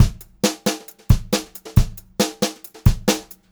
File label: 136 BEAT, Brushed Drum Loops; USB Soundscan vol.39; AKAI; HOTROD